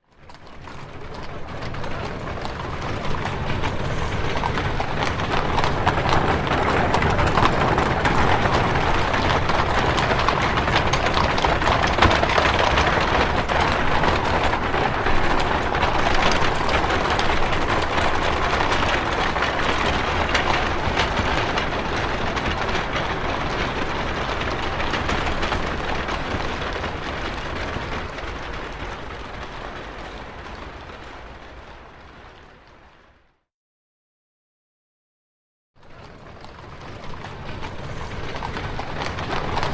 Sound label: Horse Drawn Carriage Slow By; Horses Carriages and Traps; Hollywood Edge Historical Series